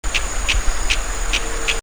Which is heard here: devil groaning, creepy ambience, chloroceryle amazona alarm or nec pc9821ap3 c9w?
chloroceryle amazona alarm